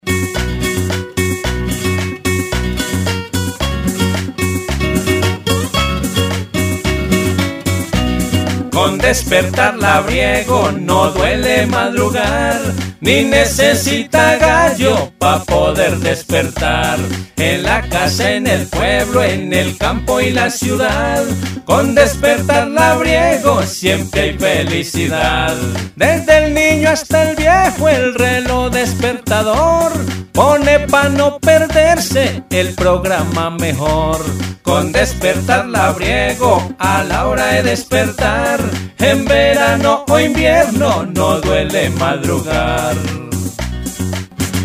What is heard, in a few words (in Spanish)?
jingle despertar labriego